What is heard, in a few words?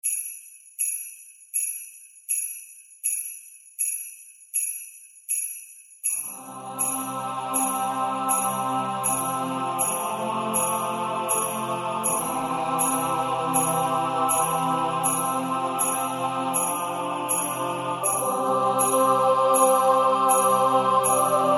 choir christmas